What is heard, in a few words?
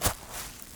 foot grass, footsteps; midnight guns